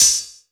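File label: open hi hat, Drum & Bass; Ueberschall; Drum & Bass Resonance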